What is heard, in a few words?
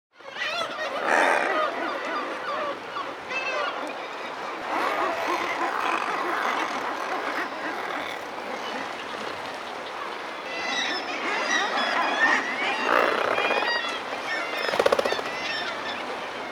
Razorbill